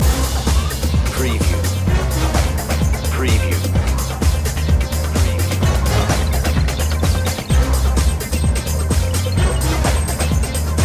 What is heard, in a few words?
sym groove loop